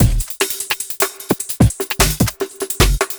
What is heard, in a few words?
Busy Beat, Drum N Bass; Sonic Foundry (Sony Creative Software); Knutrix Drum & Bass